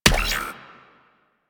Drone Death; Warframe; Weapons; Archwing; Powers; Archwing Healer

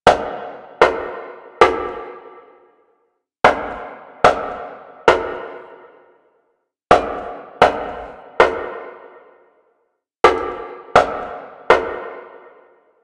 Knock Loop Close; Warframe; Quests; Entrati Labs

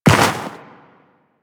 Walk Snow, Footsteps; Warframe; Tenno

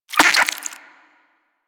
Vocal Tranq Grunt; Warframe; Hunting Critters; Nexifera

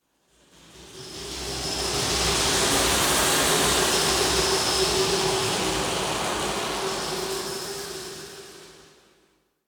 Exterior Cloud Probe, Ambience; Warframe; Rail Jack

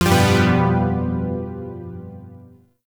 Shawzin Maj Chord J; Chords; Warframe; Zariman Shawzin

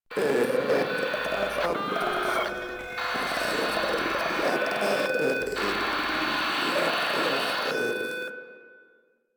Proto Infested idle; Warframe; InfestedNinetyNine

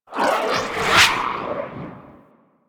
Armillary Sash Cast; Warframe; Nezha

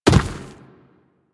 Infested Medium Land Dirt, footsteps; Warframe

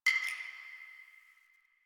Ceramic Brick Hit; Warframe; Ambience; Nineteen Ninety Nine

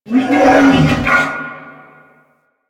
Wolf Pain; Animals; Warframe; Quests; Duviri

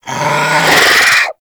Infested Charger Run Breath, Enemies; Warframe

